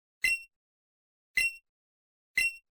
menu; pokemon

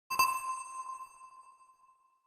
new objective; Zelda - Breath of the Wild

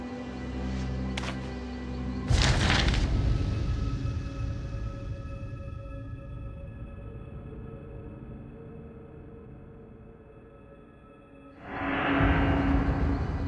ui letter

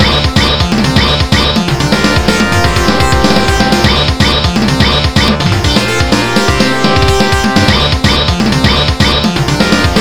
music; Duck Game

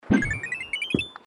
coin chain; Subway Surfers

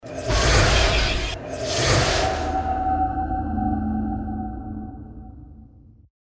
spell banish magic area effect; baldursgate

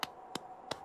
walkcycle normal; hollow knight

